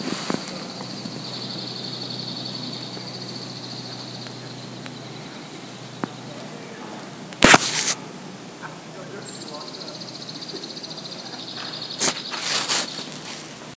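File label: White-winged Crossbill; Alaska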